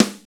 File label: SNR DRY, Snares, Roland; Northstar - Drumscapes Roland